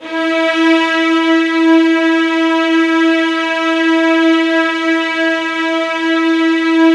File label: VIOLINS F# R, Synclavier Strings; ILIO